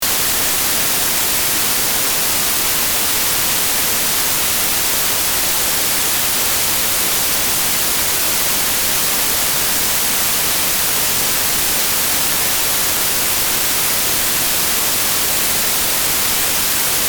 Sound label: white noise